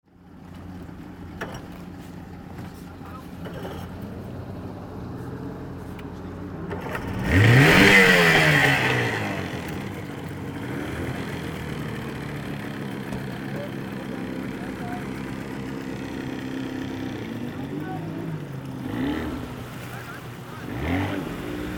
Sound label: boat being started; dlrg